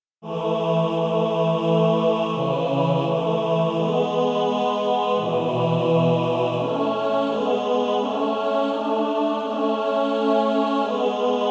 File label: Male Choir TB